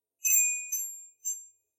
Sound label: Short Wind Chimes; SoundsCrate